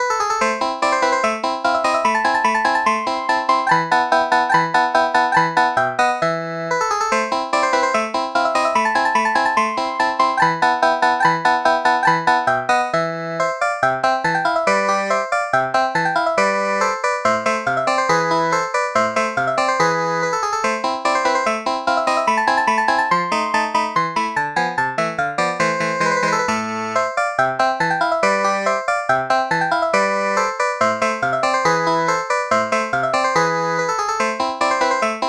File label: clavinet